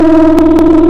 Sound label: vroom